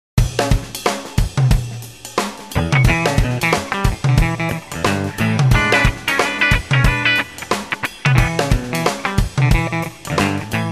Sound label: Rhythm Guitar